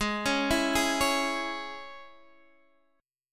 G sharp Suspended 4th Sharp 5th G sharp, Guitar Half Step Down